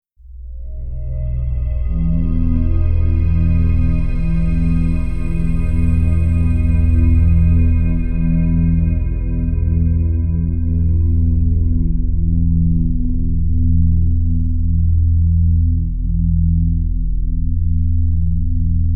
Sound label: Drone